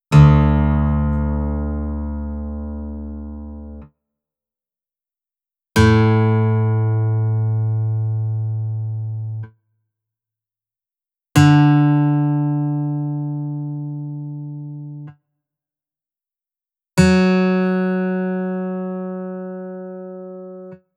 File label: Open D, guitar